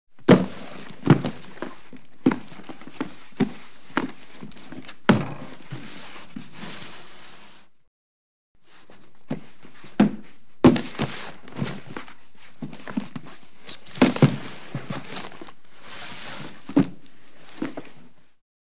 Cardboard Movement F, dragging

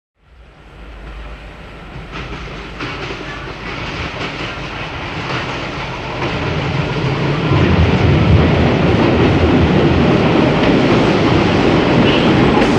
Elect Train In Away, electric

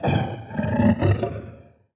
Creature Growl

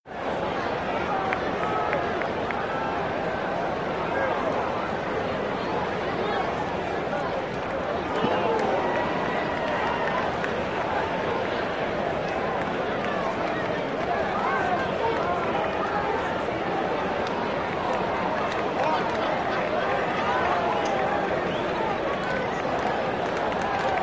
Arena Crowd Idle; sports arena

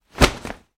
Heavy Cloth Snaps

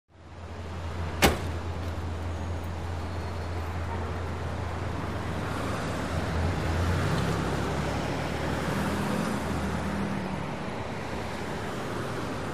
City Traffic Medium; big city

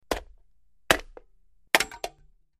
Aluminum Can Smashed

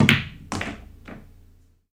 Pool Hit Ball Pocket, billiards

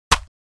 Axe Impact Body

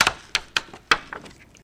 Plastic Face Mask

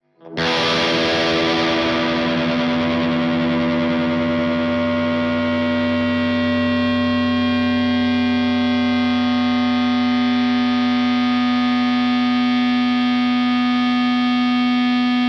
Elect Guitar, electric guitar

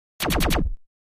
Laser Quadruple Blas

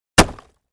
Axe Fire Log, axe hits

